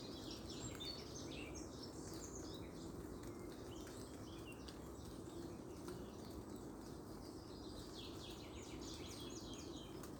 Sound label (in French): chant oiseau